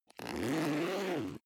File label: zipper backpack open